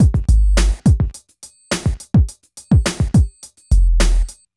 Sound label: Beat; Sonic Foundry (Sony Creative Software); Infinite Soul VOL-2; Beats